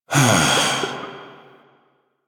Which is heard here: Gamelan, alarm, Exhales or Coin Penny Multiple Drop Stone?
Exhales